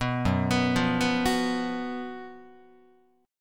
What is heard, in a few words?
Gb Major 7th Suspended 4th Gb Guitar